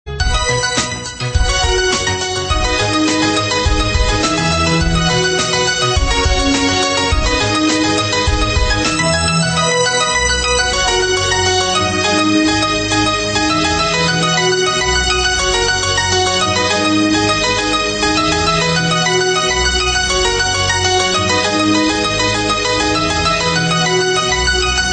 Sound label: Piano